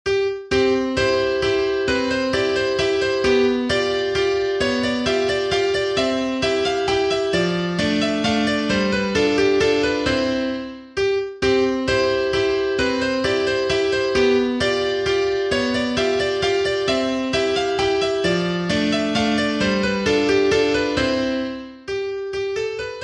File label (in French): Danse Allemande; N°1; WoO 8; Beethoven